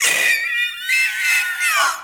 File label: floaty baby roar